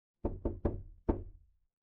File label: door knock